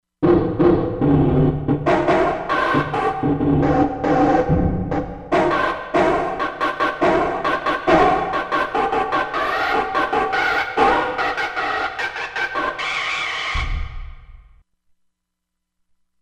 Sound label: Bad Scratch, Korg MSC-16S; Environment